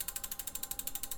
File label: wheel